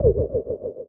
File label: Perc, drums; Touch Loops; BlissedOutBeats